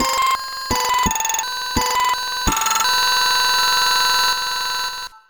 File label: noise